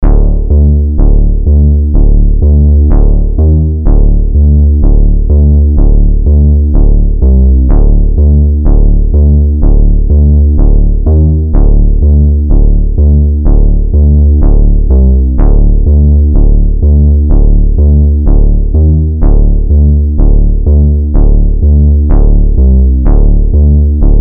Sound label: Thinking Tension, 70s Sci Fi